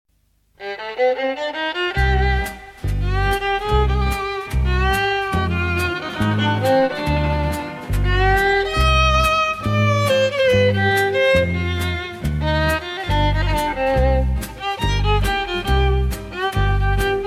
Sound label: Czigan Swing